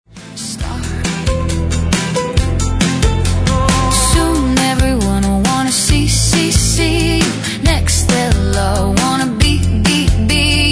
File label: Dancehall Country Rock; Modern Country